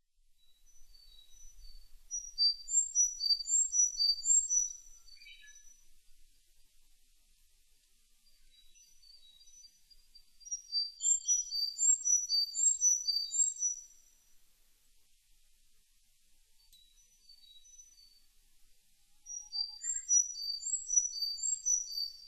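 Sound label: Sakhalin leaf warbler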